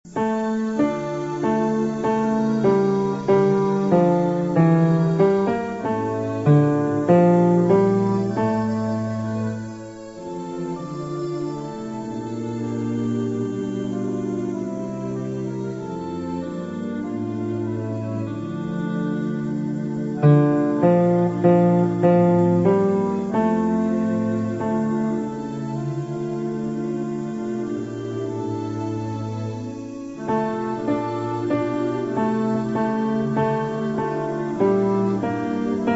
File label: Midi and Piano; S21-389 み神をたたえよ; 器楽バス 音取り練習：Ob - Eh - Strings - Piano 2,3節のみ